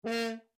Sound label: HORN A4 FF STACCATO STOPPED, French Horn in F; Alexander French Horn in F; Musical